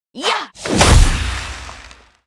Cleric F skill offense

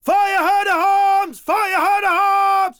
Enemy Generic Human Spawn Army

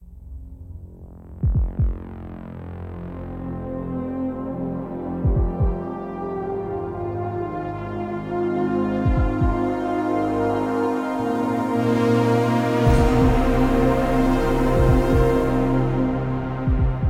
Mech Suit Entrance 16 Bars 126 Bpm

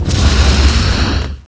Ice Target

